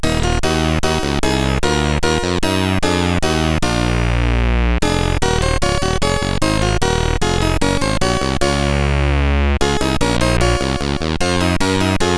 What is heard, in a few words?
Bach CSG8580R5; sid